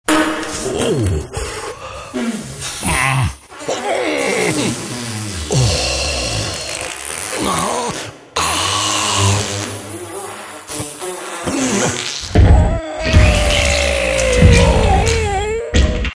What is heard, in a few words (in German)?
furzen